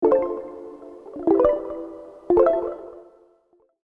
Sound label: logo messenger